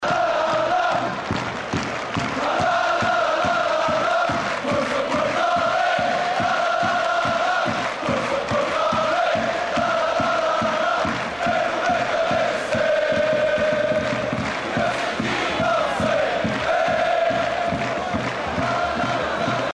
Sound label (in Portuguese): Quero Ver Te a Vencer, Chants